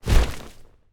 wing flap; smite; horus

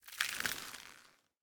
armor; smite; ganesha

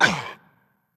hitreact large; smite; roman archer

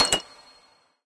click; smite; chronos

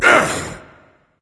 ouch; smite; odin

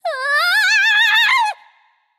nv fear; charybdis

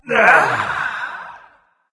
nv sigh; smite; voicepack; mercury

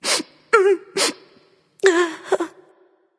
boo hoo; smite

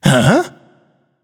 nv surprised; cupid

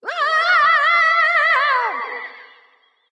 fear light; smite; voicepack; hel

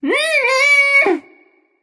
tongue; smite; voicepack; isis

